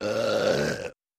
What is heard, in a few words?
Med Belch; belching